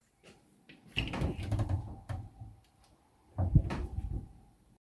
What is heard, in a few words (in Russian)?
dverca bolshoj vitrinki v gostinnoj